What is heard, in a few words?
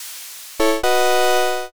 challenger